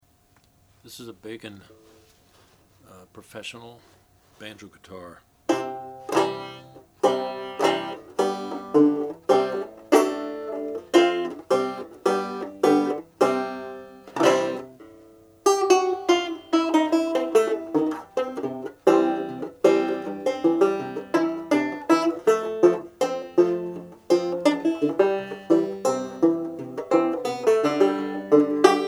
bacon guitar banjo maple

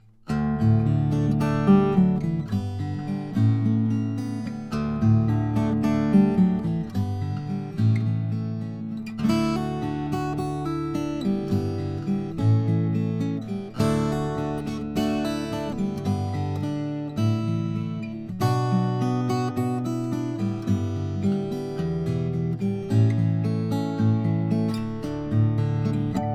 lowden s50 honduran rosewood

